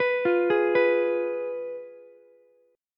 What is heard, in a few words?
F Diminished Ukulele; 16,17,16,14; Fdim arpeggiated; JGuitar